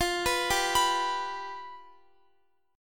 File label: F Suspended 2nd Flat 5th, Guitar; Fsus2b5; arpeggiated